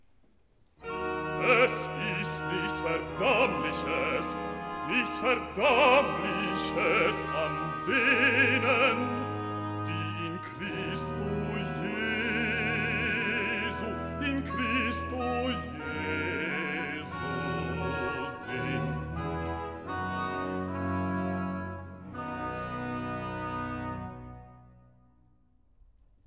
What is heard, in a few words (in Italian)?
Recitativo (Bass); Bach; Cantatas; Wer mich liebet der wird mein Wort halten II; Es ist nichts Verdammli